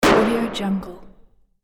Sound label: distorted smash impact short